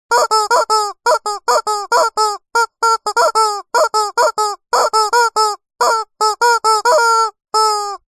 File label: leisure toy squeaky rubber chicken squeeze cluck multiple